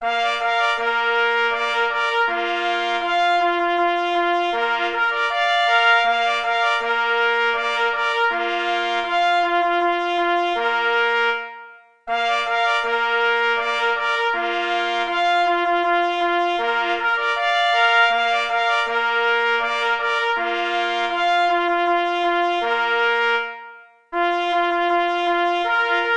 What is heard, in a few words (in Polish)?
Marsz; J. Rosner